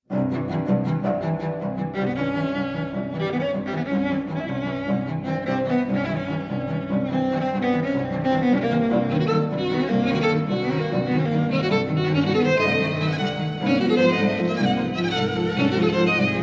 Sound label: String Quartet No. 2 II Burletta